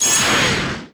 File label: Megaflare Landing; disney star smash android ios mobile gamerip 2020